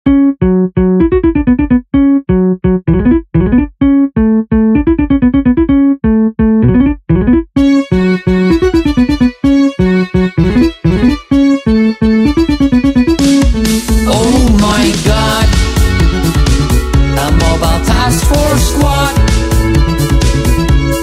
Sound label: song; nine tailed fox; scp containment breach